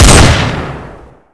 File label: deagle, weapons; garrysmod; fokku tc